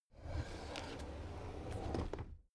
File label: drawer cabinet crammed open soft